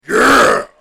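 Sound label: yea scream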